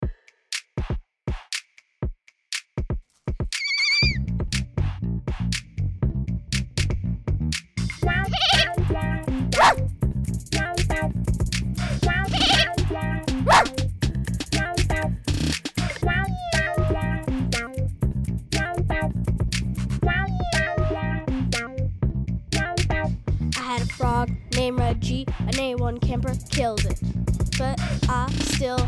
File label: Animal Noise